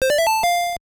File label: Hammer Point; Super Mario Bros. Remastered; Hammer item